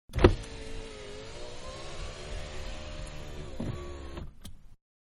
Mid Size Car Auto Window Opening, Car Mid-Size-Auto-Window Opening-Interior; Vehicles; Manhattan Production Music